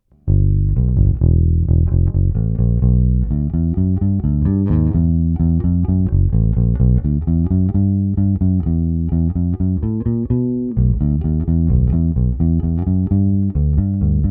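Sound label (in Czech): Palisandrový hmatník - tónová clona stažená - "nový" krk s palisandrovým hmatníkem, Precision bass; Téma: Jay Turser Precision bass JTB-400C-BK-A-U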